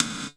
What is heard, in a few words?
GATE STICK, effected drum; Crypton - DrumBase5000